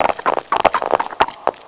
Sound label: Crowd